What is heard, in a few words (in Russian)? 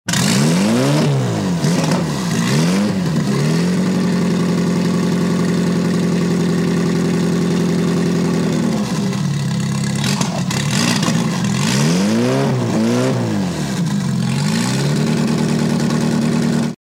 pytaetsja podnjatsja na gorku po pesku